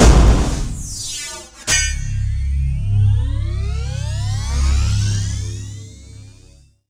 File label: FX HIT, Techno Trance; Ueberschall